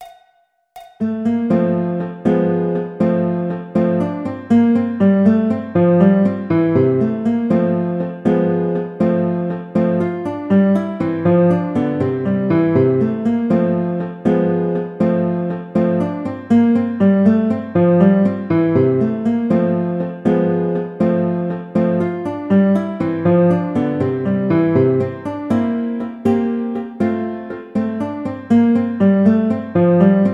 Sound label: Andante Guitar, classical; Carulli Op 241 No 17